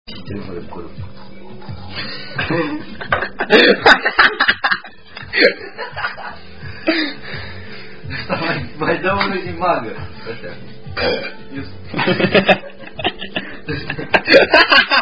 Romanian Version Of Are You A Cardinal Drinking Game